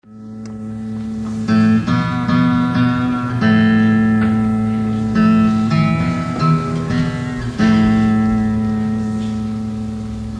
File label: Tuning